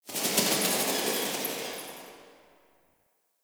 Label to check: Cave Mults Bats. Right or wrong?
Right